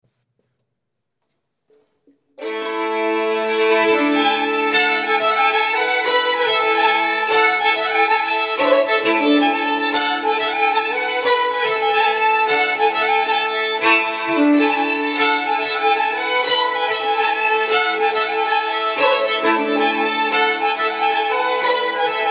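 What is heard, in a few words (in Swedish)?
Polska, svensk säckpipa; efter Olof Andersson Hulteberg Eda sn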